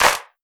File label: clap single shot a sharp key; single hits one shots